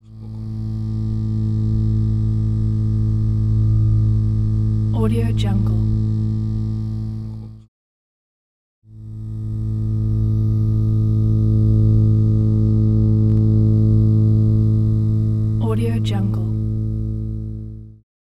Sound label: voltage hum